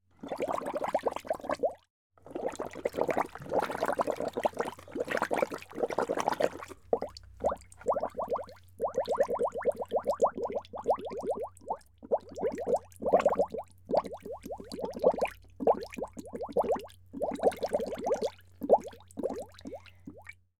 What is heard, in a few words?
Bubbles